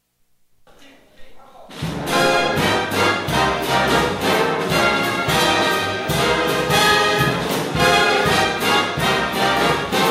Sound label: Jazz Ensemble